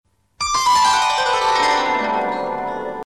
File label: Melody Cool